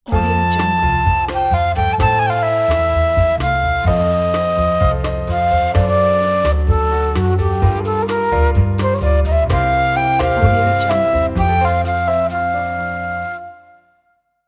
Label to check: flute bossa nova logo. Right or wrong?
Right